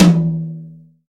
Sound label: hi tom sample e key, Royality free tome drum sample tuned to the E note. Loudest frequency: 592Hz; tom drum samples